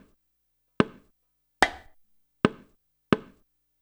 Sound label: percussion loop; InVision Interactive; Percussion Slam; KWICKIE